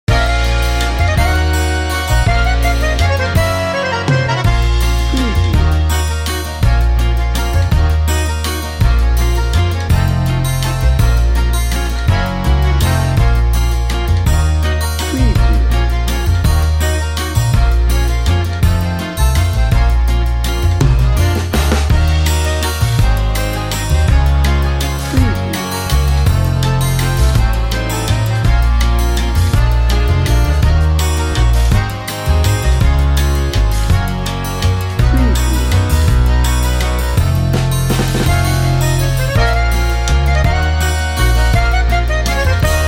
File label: wine and romance bed